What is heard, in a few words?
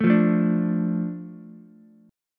A Suspended 4th Sharp 5th, Ukulele; Asus4#5 strummed; JGuitar